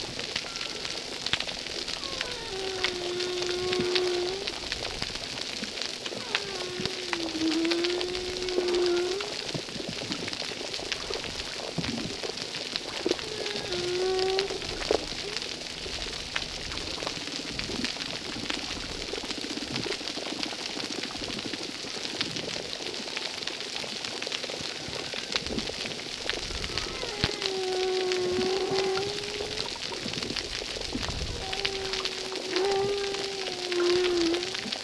HumpbackWhales, filtre; RECIFS; Recif10

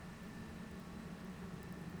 pulses, BALAENOPTERA; MONACO 2022-07

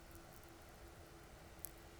pulses, BALAENOPTERA; MONACO 2022-07

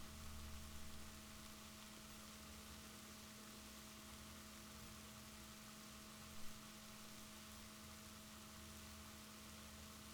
rorquals negatif, Balaenoptera Physalus Chile

